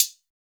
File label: hihat closed standard